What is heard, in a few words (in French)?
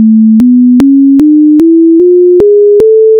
Gamme Pythagore; Mathemusique